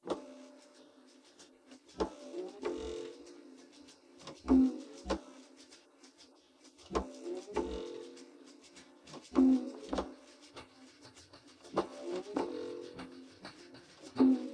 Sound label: voice